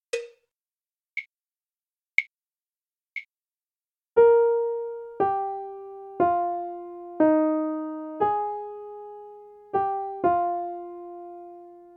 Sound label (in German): Melodie